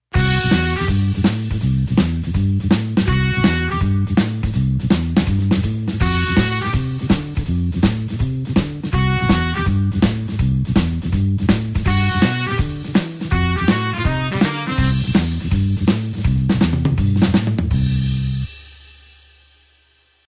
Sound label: blues horns shuffle C